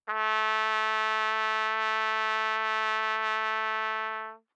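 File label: Trumpet vibrato ff A3 stereo, Bb Trumpet; MIS Pitches - 2014; Brass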